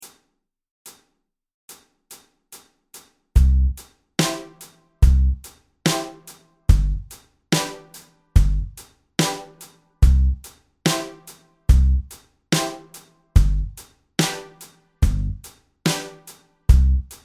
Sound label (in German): Lustig gehts im Zwei Schritt Tempo 72